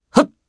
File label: Jump, jp; Theo; kings raid